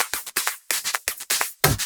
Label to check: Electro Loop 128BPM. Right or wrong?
Right